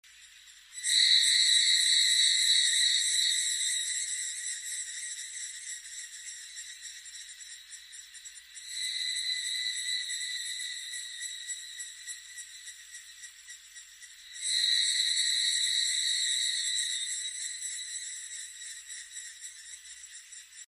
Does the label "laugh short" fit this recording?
No